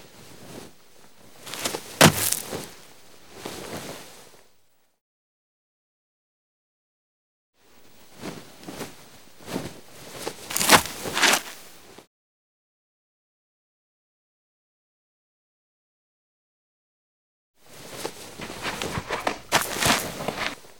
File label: basketball player; trainer; Call of Duty Modern Warfare